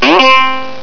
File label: sonic spring; ze sonic